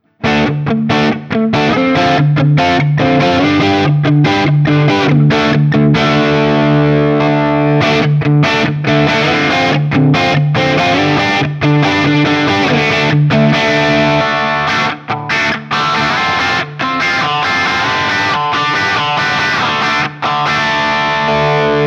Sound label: Guild Nightbird I JCM800